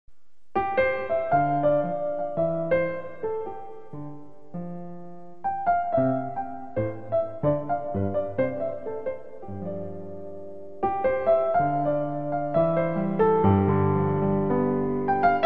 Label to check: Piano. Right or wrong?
Right